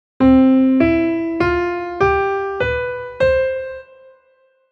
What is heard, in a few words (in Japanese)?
琉球音階音源